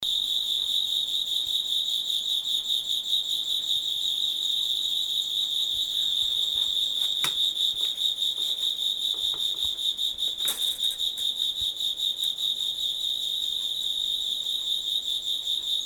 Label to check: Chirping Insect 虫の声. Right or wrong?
Right